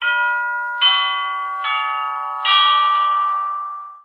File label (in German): Big Ben Klingel